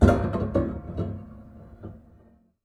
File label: String Rub Erratic, Piano; Piano Miscellaneous